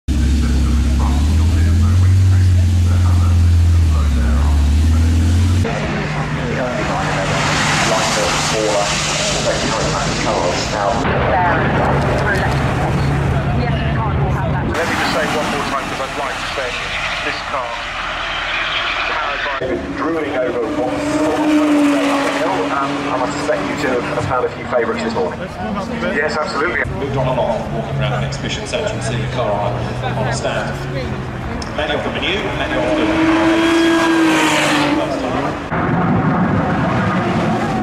lotus evija, اگزوز; لوتوس اوایا

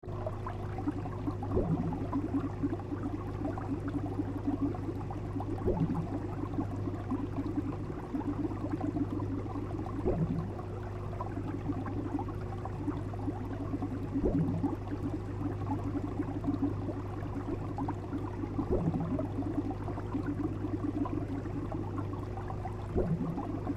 indoor nice fish tank ambiance

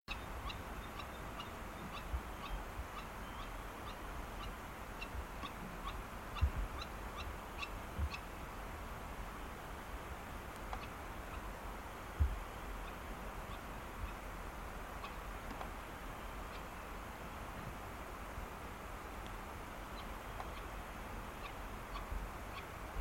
Lanner-falcon, پرنده لاچين

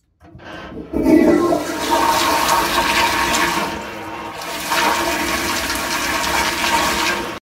toilet

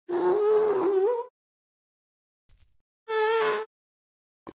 Minecraft Ghast; گوست در ماینکرافت

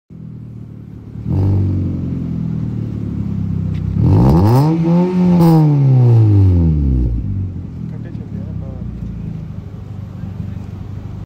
Changan, اگزوز

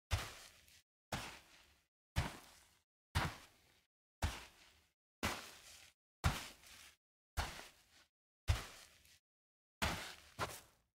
Walking slowly on sand

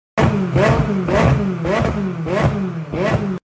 Ford Mustang

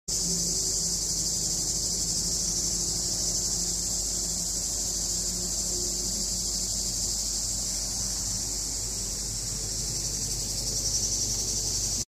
Crickets in summer

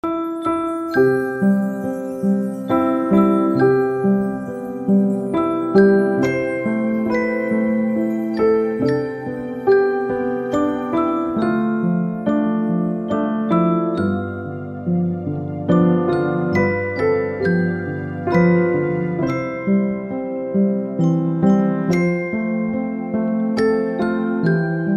wordless lullaby

